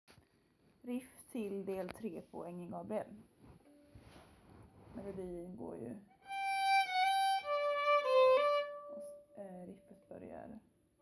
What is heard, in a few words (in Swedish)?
vals, riff c-del; Aengeln Gabriel, vals av Olivia Lagerberg